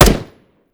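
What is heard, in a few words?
weapon fire player; gmod; viper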